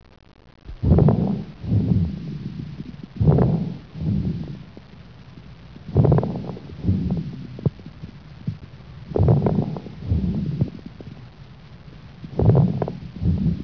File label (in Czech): střední chrupky; plice